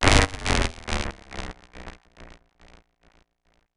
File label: Odd Stab